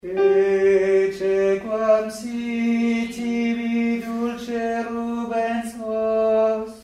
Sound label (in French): soprane; ecce quam sit